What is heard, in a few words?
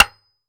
Impact Wood Impact 2x4 Block Hammer; Impacts